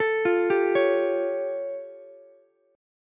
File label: A Augmented 7th A, Ukulele; arpeggiated; JGuitar